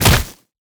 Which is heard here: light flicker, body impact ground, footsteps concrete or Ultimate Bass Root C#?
body impact ground